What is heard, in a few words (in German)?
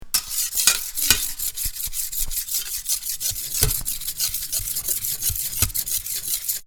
Blechklatschen Ratschen